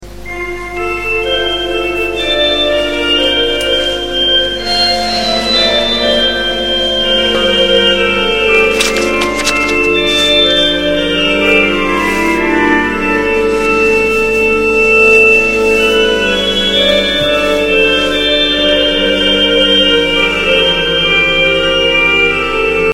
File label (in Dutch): orgelspel